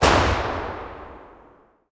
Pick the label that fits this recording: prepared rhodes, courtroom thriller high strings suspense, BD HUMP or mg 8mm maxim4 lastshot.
mg 8mm maxim4 lastshot